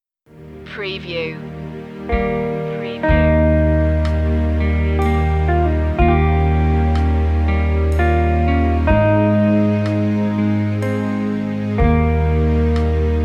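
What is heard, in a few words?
amazing grace very slow verse 62bpm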